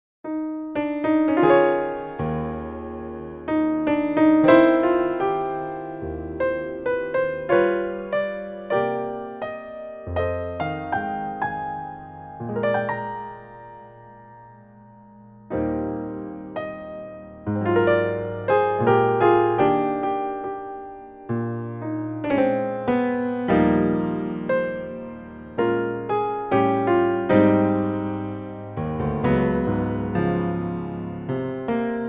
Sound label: piano